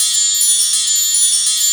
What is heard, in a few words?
WINDCHIME